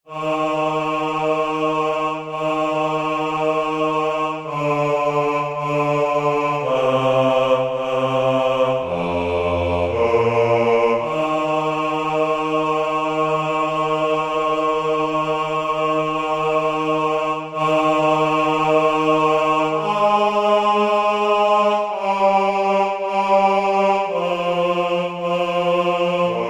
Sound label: bass